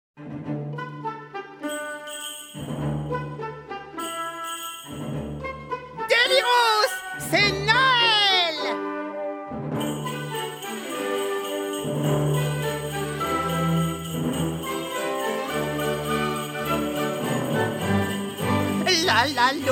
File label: Jingle Bells